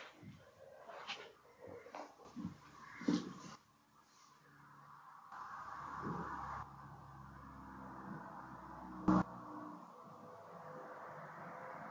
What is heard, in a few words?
3rd Floor Hallway EVP; fairfield county infirmary return investigation